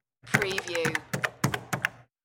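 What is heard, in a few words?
Cash Register Buttons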